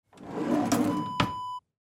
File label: drawer kitchen close